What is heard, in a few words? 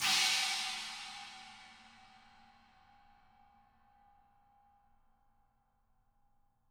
CHINA CRASH; ILIO; Double Platinum Drums 1